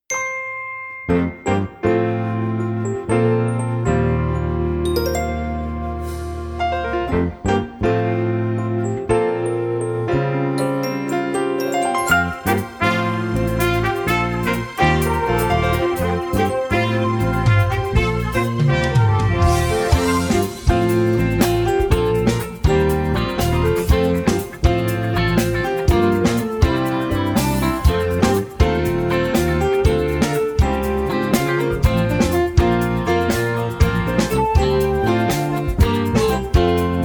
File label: accomp.